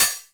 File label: open hihats, techno; Techno ID; single-drums